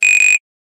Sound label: Buzzer, Ringing Tones; HMD Barbie Phone US